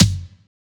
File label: Tom F#; samples